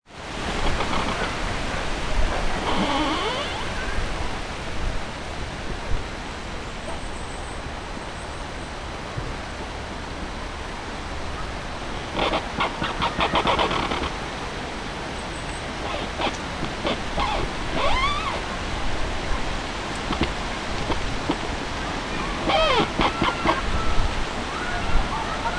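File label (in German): baumknarren, Peenemünde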